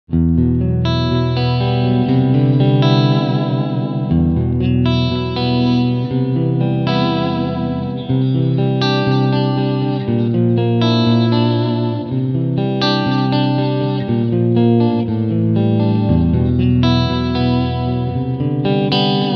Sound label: Cool Clean